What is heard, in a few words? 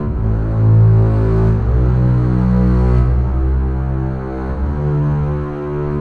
Bass; Струнные инструменты (Movie Score): Bass A; 80BPM; film music; Strings